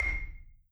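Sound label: Marimba medium F C6, marimba; Sampleheads - Dave Samuels Marimba & Vibes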